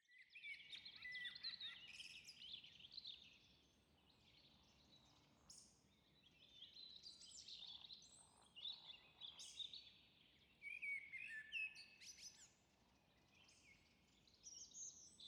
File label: blackbird